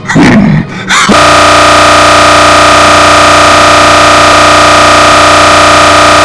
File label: brutal note